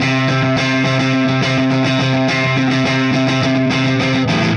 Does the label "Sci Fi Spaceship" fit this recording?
No